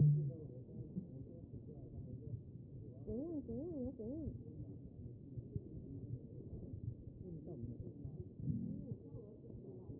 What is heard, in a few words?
A CHEST; B MODE